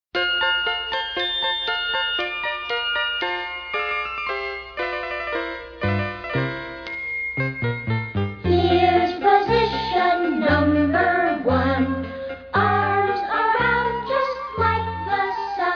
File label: 5 Positions All In A Row Vocal